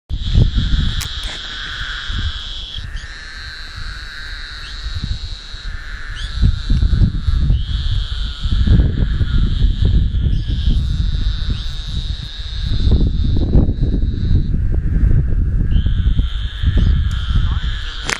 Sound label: Gastrophryne olivacea